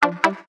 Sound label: dongdong, notifications; ZTE nubia N1